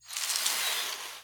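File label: ancient sword, attack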